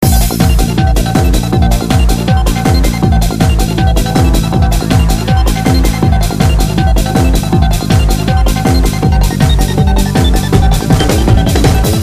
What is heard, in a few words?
loop